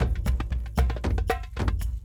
Kendong, World Percussion; Brekete, Shaker, Dumbek

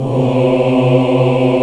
AFRO AH B 2, CHOIR AHS; Spectrasonics; Heart of Africa

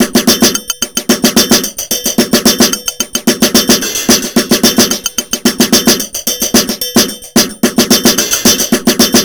TRIPHOP, GRV110; INIS; Funky Xtreams

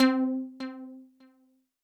Synth Prophet One Shots, Keys C; Electronisounds

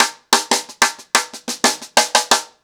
Break 90BPM, ACOUSTIC DRUMS; Sonokinetic - The Roots Drumloops

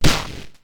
Loop Snare, Ensoniq ASR-X Pro

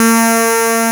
PPG 2.3; DIGITAL1 HYB

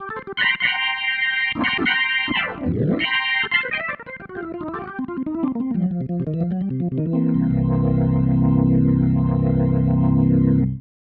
Ending, Organ; Sonic Foundry (Sony Creative Software); Joe Vitale Organ Donor; Endings

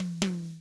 BOSSA, Drums; ILIO; Double Platinum Drums 1

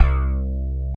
Slap Bass; Big Fish Audio; Old Skool House; OSH Bass

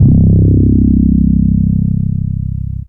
Single Electric Basses, Dance Floor Bass

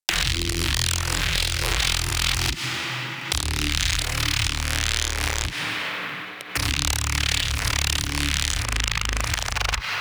Midrange Synth; neuro; BIG5AUDIO

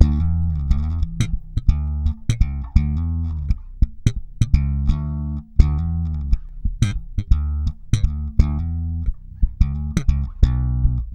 FX SLAP, Bass Grooves; USB Soundscan vol.30; AKAI

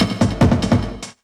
LOOP SD; Ueberschall; Drum & Bass Resonance; 145 BPM

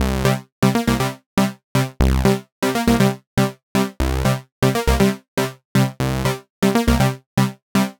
Chord Synth Cm, 120bpm; Zero-G; Extra Chord Progs

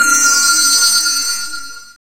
SYN-FX

